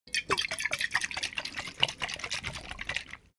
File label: bottle vodka shake fast; berufe; gastronomie